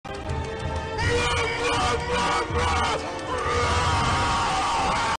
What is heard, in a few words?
angry caveman noises